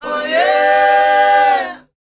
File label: O YEAH, S. AFRICAN, World Voices